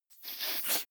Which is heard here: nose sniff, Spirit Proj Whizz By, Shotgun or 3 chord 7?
nose sniff